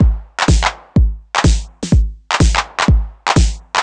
drum loop niner stripped 125, Classic Chicago House; Sample Magic; Drum Loops